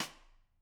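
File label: Snare Stick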